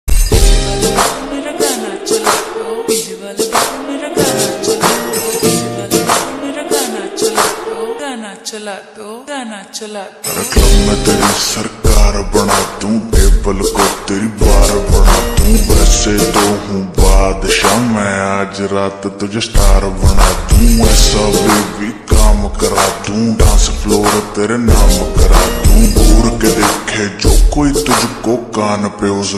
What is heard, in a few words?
lofi rap ringtone